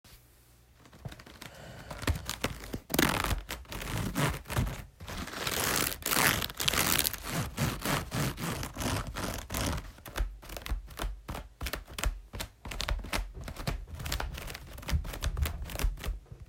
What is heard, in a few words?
Chair